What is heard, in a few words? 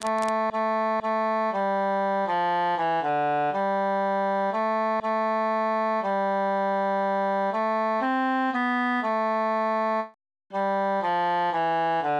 cantus mute; la bionda trecca